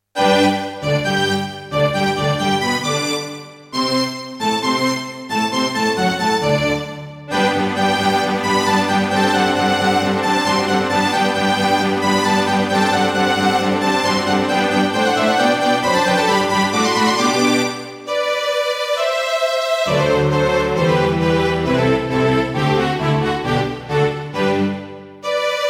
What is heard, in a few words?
Roland SC-88; Mozart - Eine Kleine Nachtmusik partial; classical